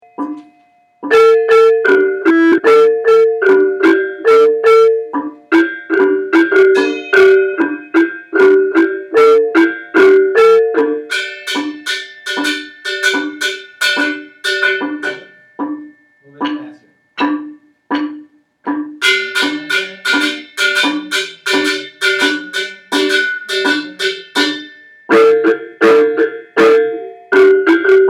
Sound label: Joged Reyong, gamelan